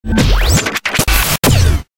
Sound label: Glitch Sequence